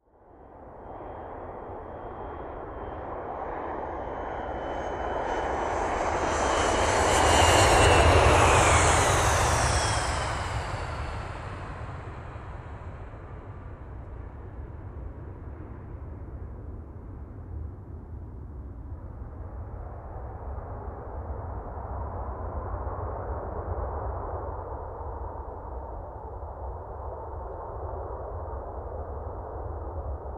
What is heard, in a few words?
Airliner Flying by whirring sound